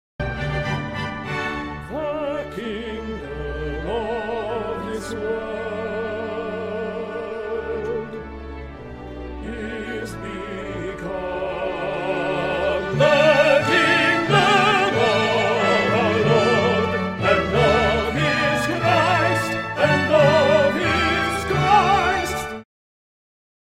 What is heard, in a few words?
tenor, Sing Along; Tenor Learning Track; New Church Day Pageant